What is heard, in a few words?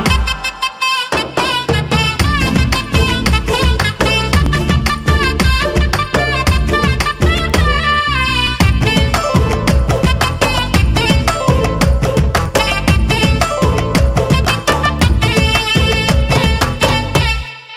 Drum Beats; Telugu Ringtones; 18 Sec; from Attarintiki Daredi Movie